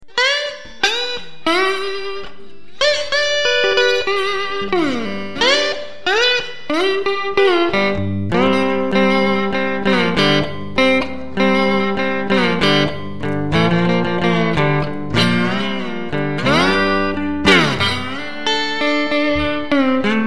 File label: Slide, blues